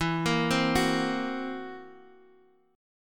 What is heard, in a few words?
Gb 7th Flat 5th E